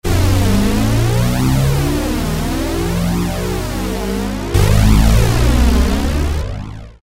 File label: Roland MKS-50, Take-Off; Factory examples